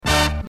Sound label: disco jingles